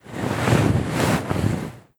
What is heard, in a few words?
Rustle of Cloth; Rei Yumesaki